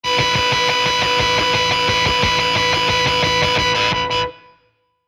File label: Electrical Bright Pulse Buzz; Blastwave